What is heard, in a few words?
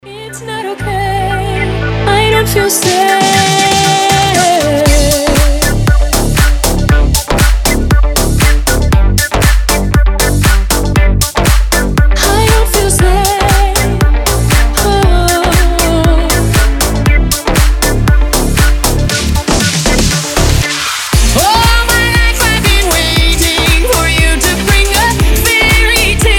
remix